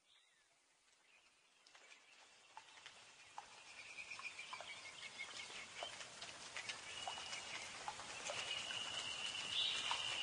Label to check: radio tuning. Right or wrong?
Wrong